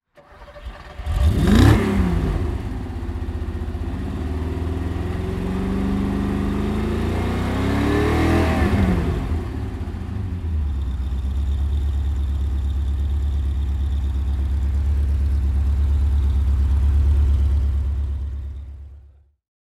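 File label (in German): Golf, Porsche 928 - Starten und Leerlauf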